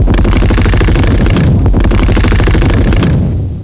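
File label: battle machine gun; netpanzer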